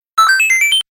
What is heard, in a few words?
hit